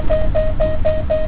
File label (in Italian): Allerta, train; Aln501; OpenBVE